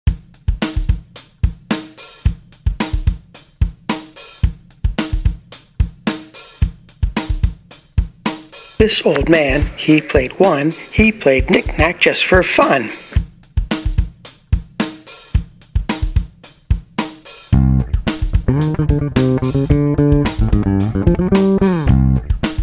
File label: Rap scape This Old Man 4 Bar